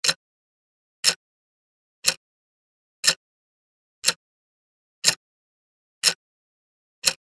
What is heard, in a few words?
clock tick